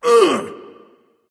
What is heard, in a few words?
grunt hit; smite; voicepack; tyr